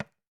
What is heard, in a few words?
TAM TAM, African Drums; E-MU Formula 4000 Series Vol. 4; Earth Tones